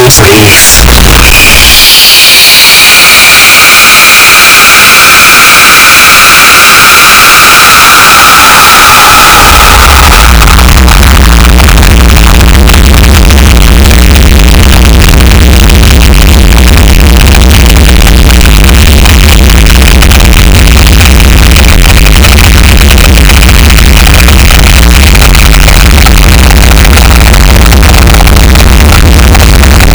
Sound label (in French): mouvement interne lent et, volcano